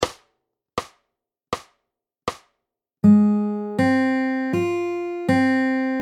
3 String Arp, fingerpicking

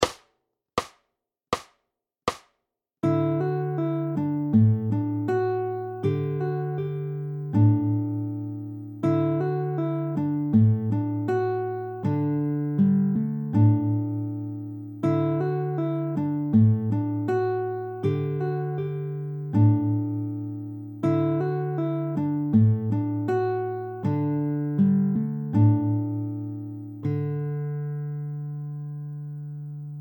Travis slow, fingerpicking

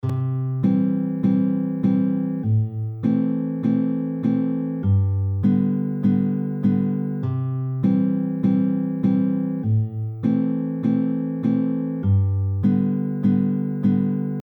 3 Finger Pluck, fingerpicking

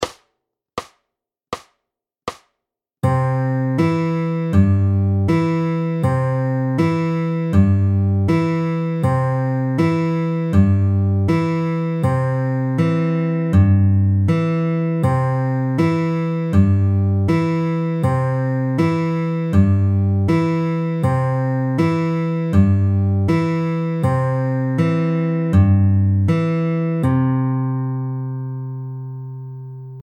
Pinch SLOW, fingerpicking

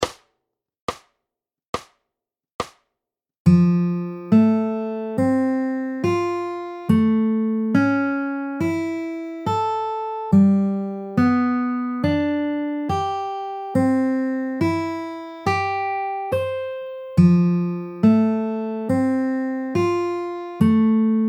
Skipping Chords

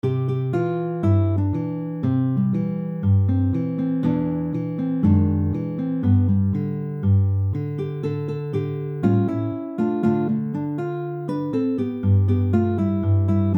Verse Fast, fingerpicking

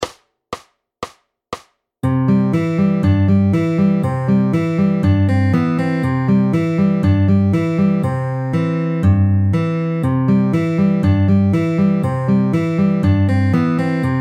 Pinch FAST

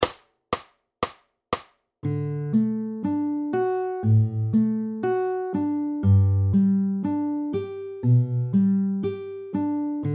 Travis fast, fingerpicking

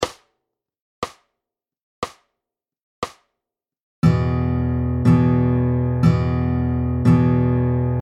E Power

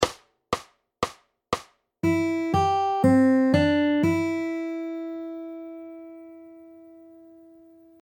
jingle audio

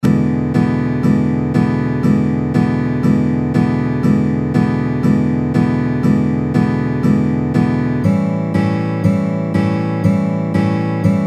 12 Bar in E, fingerpicking

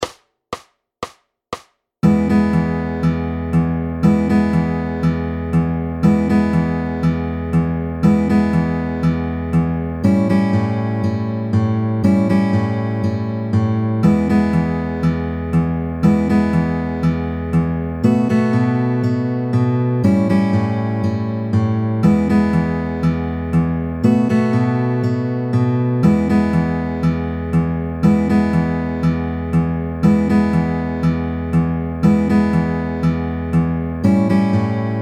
Fingerpicking 12 Bar Fast

